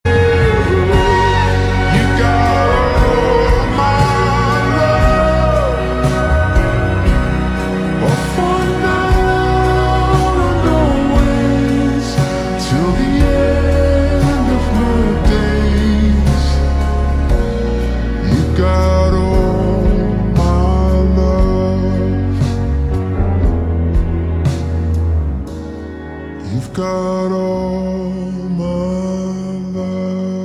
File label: Slow Reverb Version Ringtone